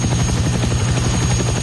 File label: Helicopter Cabin